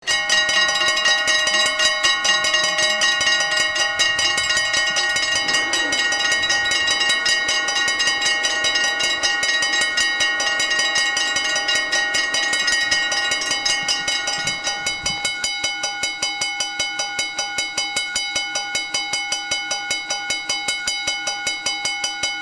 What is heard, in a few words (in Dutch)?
overwegbel start hoog en laag eindigt laag snel